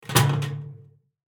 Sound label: barrel Open